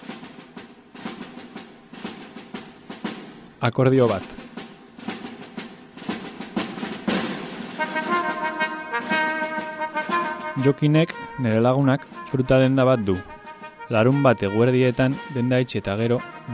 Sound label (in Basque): akordio bat